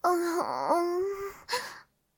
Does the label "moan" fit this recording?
Yes